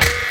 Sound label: Darwin Snare; Ableton Foundation FreePacks; Wicked Kits